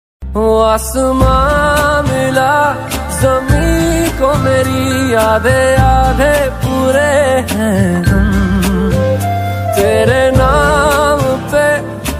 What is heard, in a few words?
sad ringtone